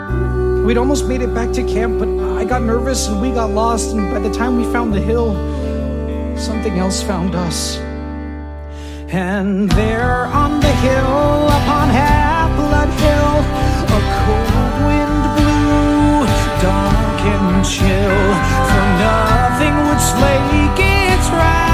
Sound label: vocals